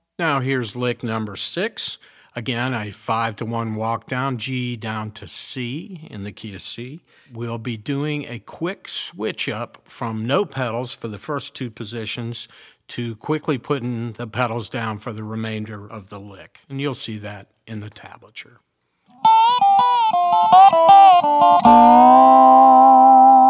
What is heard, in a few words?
resonator pedal, steel guitar; mxr custom shop resonator ii pedal